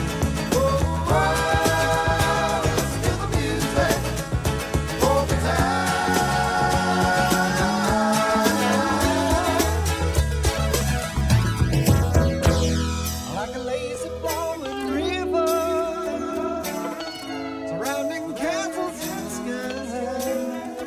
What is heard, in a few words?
flanger